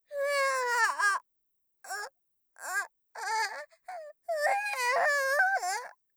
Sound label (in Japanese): ふえぁ; 効果音なし